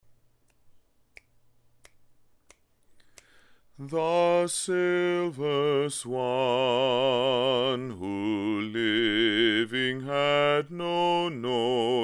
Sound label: Swan Alto; The Silver Swan; Alto 2